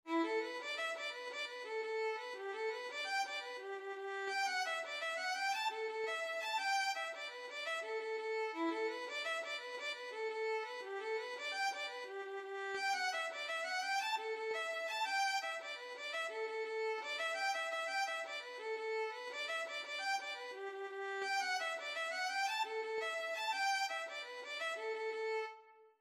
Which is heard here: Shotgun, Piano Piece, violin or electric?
violin